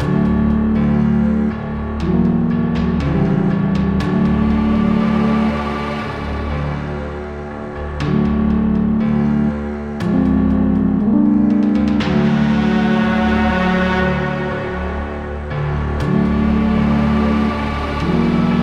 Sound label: The Calm Unknown (loop) +Drums